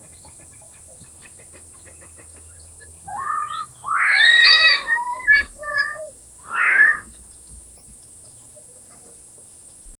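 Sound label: dinosaurs is running back, jungle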